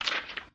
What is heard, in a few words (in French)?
papier